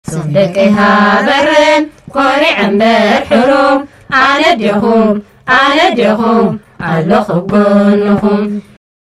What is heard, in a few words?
african choir